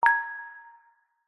multimedia game sound chime selection mallet warm family game